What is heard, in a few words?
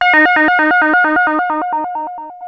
ARP 2600 F#2, synthesizer; Hollywood Edge - Giorgio Moroder Rare Synthesizer Collection